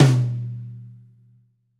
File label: Pop Tom, Roland BK-5